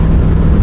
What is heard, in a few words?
cord; Mafie 1